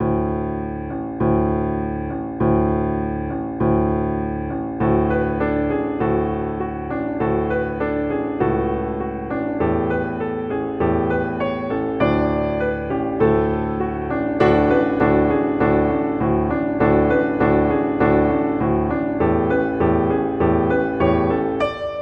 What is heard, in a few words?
Halling; Grieg; Norwegian